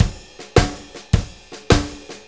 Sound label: Blues Loop, Drum Tools, Full Kit Loops